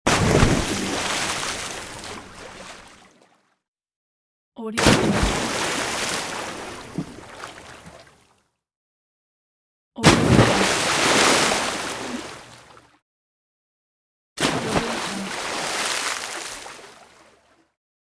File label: water splash